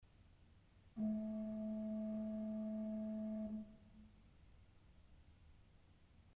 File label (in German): Principal 4' A, ORGEL pipes